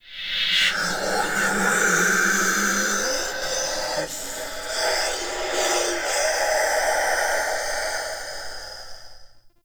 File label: Binaural Whispers; Warframe; Shadowgrapher